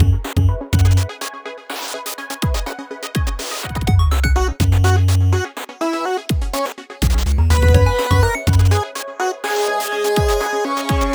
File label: Stage back Loop; invercity